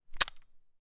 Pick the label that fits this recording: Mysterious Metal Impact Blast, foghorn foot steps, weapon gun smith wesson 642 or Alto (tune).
weapon gun smith wesson 642